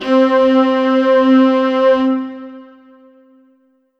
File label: Korg M3R Violins C4